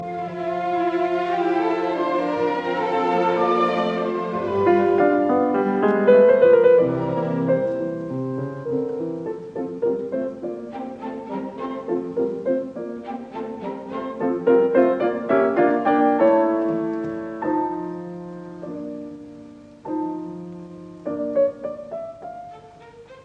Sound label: Piano Concerto in A minor Intermezzo Andantino grazioso; Schumann; Op 54